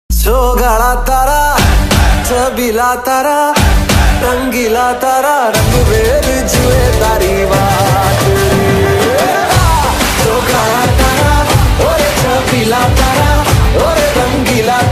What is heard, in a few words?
Male Version Ringtone